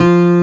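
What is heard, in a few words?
nylon, ambi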